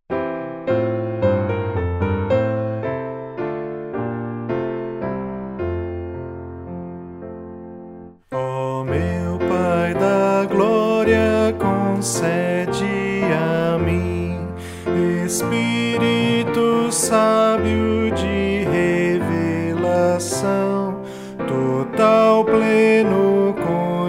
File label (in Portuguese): voz piano